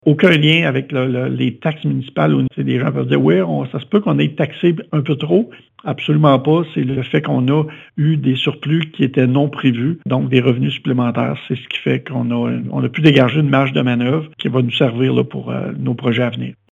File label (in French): bourdon